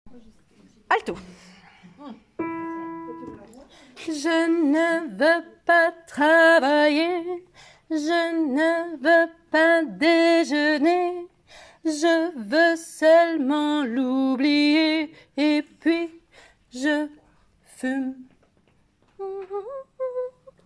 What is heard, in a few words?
alto